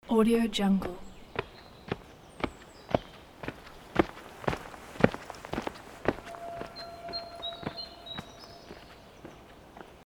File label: walking by through woods